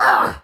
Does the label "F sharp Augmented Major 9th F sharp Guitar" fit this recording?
No